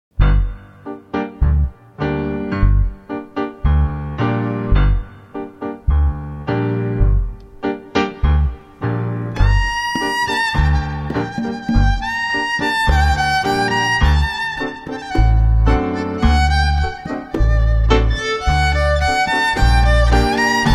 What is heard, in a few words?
Klezmer Melody